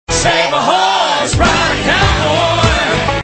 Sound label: chorus